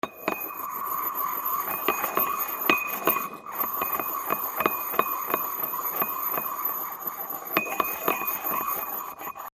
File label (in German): Moerser